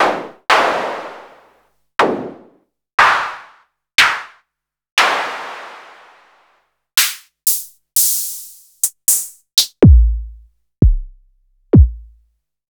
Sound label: Novation Bass Station 2; Sliced